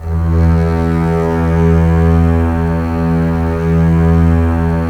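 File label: STR CBS, Strings; Roland - LA Composer vol. 4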